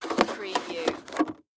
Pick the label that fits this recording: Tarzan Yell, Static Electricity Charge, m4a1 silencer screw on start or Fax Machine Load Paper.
Fax Machine Load Paper